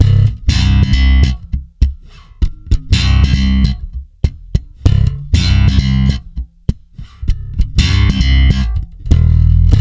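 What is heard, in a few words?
C, funk swing, bass; Spectrasonics; Bass Legends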